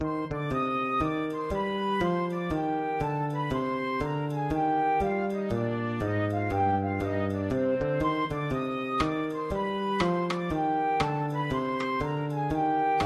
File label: bach bouree, big band